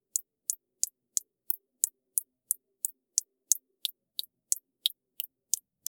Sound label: persistent dripping bed a; empty space